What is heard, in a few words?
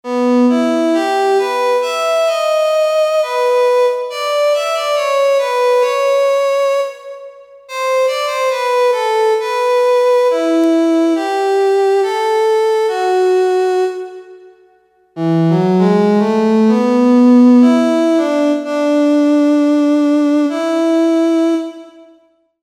Viola